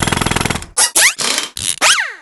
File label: fusion; Garry's Mod; promenade upgraded fusion tool